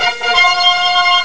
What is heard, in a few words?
start; spaceinvaders